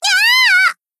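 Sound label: attack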